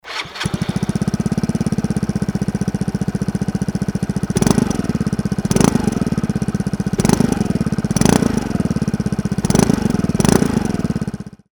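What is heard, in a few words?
22racing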